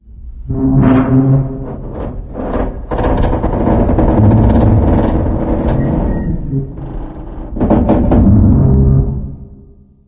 fire groan; random amb sfx

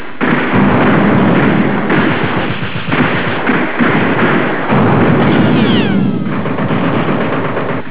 battle loop; Ambient; Sid Meier's Civilization 4 Complete; Beyond the Sword; The Road to War

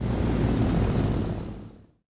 Airship Hurt; Sid Meier's Civilization 4 Complete; Beyond the Sword; Units

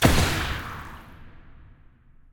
shoot; Alawar; Crusaders Of Space 2

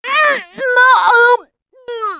choke, russian, Survivor Voice Producer

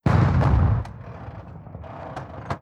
distance artillery unit fire; Hearts of Iron IV Death or Dishonor

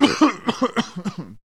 man cough; Mount&Blade Warband

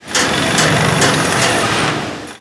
production aircraft; Hearts of Iron IV Death or Dishonor

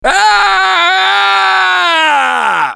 Death Scream, Survivor Voice; Gambler; russian

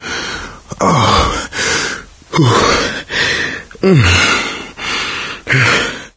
heavy breathing; Left 4 Dead 2; coach

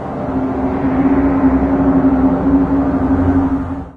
cave hit, ambient atmosphere

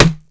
shield hit wood wood; Mount&Blade Warband

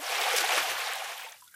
wade, footsteps; Garry's Mod; hl2

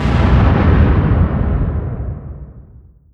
distance ship hit big effect; Hearts of Iron IV Death or Dishonor

